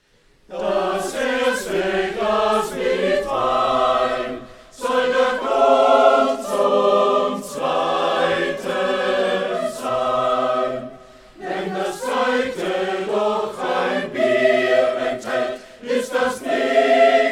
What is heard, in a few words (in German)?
Trinkspruch, Chor; Chorgemeinschaft Freistadt und Hans Sachs Chor Wels